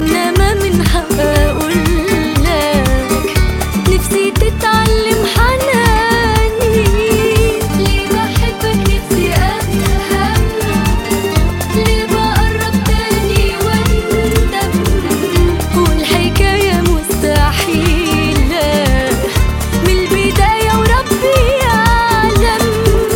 Arabic Sad

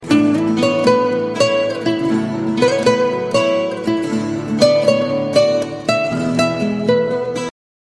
Guitar

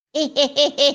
Scary Cackle

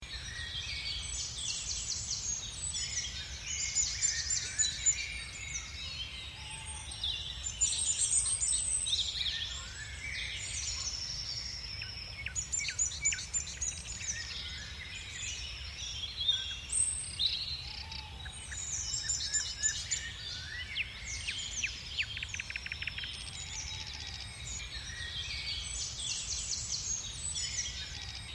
Bird

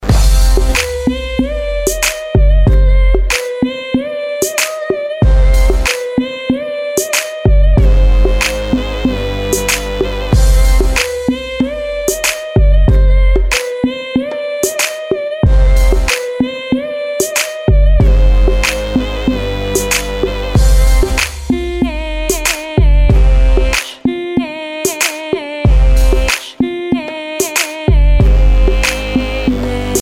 Oriental

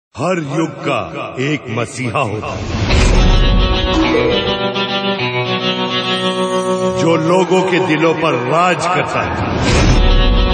Entry Tone

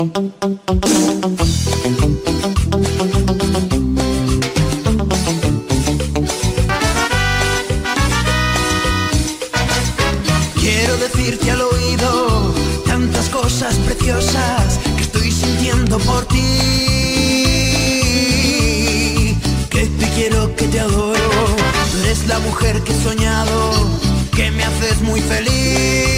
Cumbia

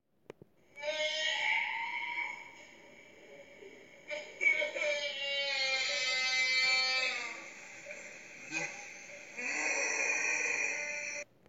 Crying baby slomo

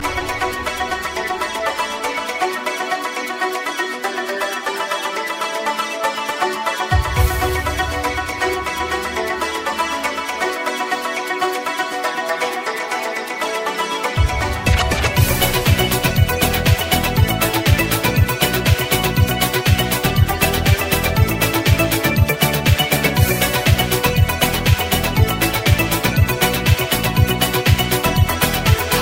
Samba

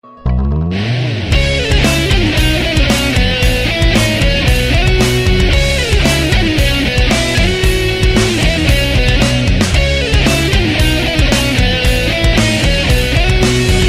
Guitar sad

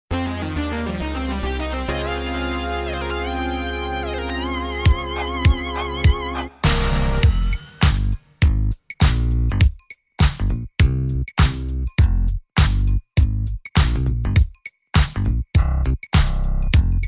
Chunky Instrumental

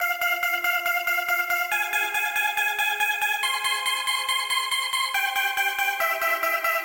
Padloop

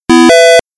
Nortel Low-high

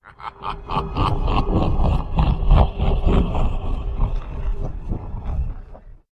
Evil Laughter

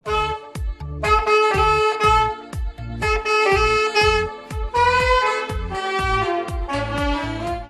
Sax; Black Sax Guy

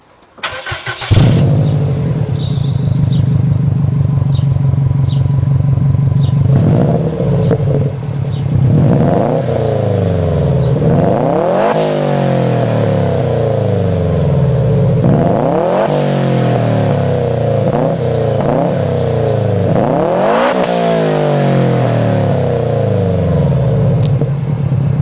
Subaru Wrx Sti Sound

